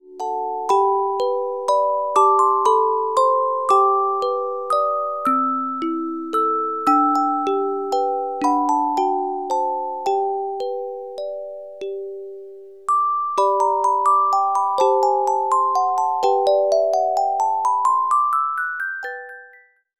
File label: Carillon Music Box Melodies